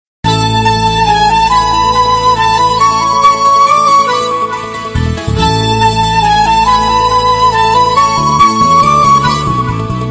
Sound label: flute ringtone